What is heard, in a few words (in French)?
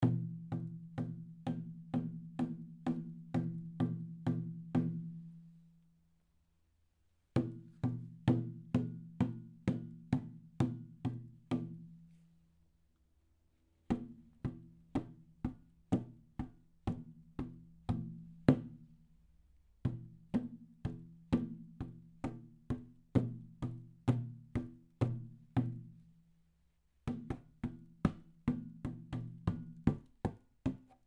GROS TAMBOUR EXOTIQUE